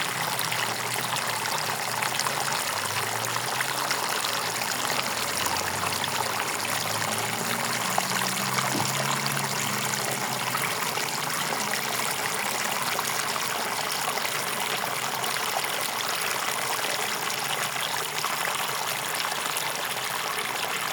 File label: Water sound effect